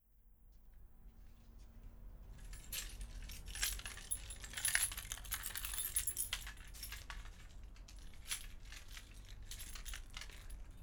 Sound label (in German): Outdoor Amience Schluesselbund elektrische Gartenschere; Lewitt Kugel